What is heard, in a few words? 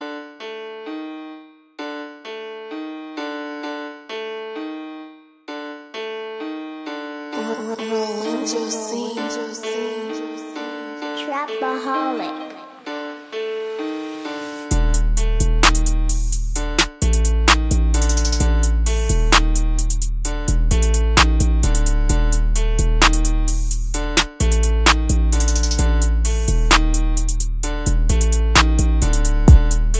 Rappers type of beat